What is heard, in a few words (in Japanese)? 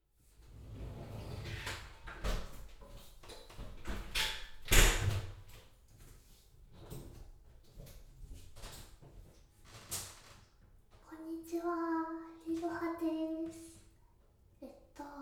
お風呂でおしっこ我慢しながらクチュクチュオナニーからのおしっこしちゃうの